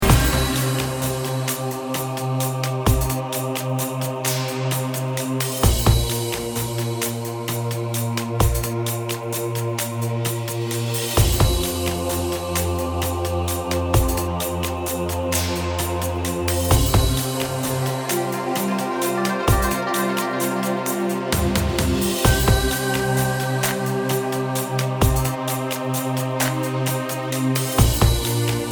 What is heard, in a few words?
Violin Crying